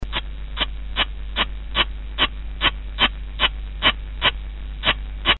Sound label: Sound Oophaga Pumilio solarte; Dendrobatidae